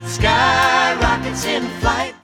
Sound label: Exclamation; Afternoon; ADelight